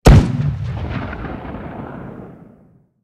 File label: art cannon shot far; guns; War Thunder